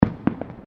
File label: Fireworks Multiple Firecrackers; Backgrounds; Crafted Signals